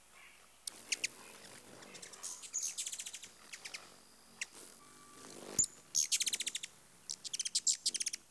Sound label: ruby throated hummingbird